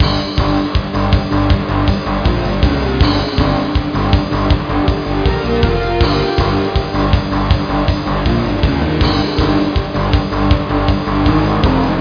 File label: MENUMUS